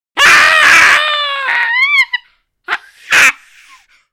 Baboon Screams Breat

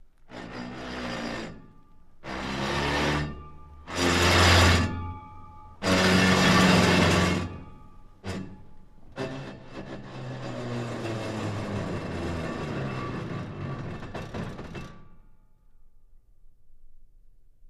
Car Transmission, bad transmission

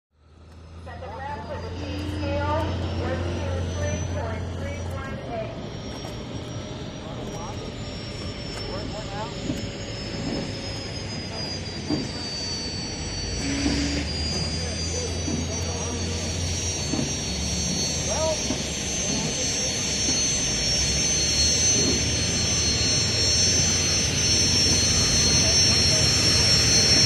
Hydroplane Dock Amb

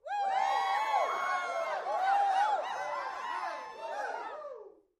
Crowd 20 Rowdy Short, small int

